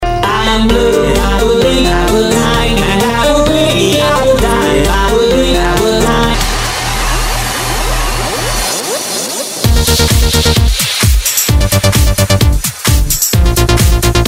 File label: blue electro mix